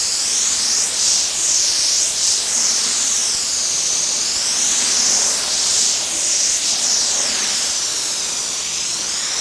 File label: air leak long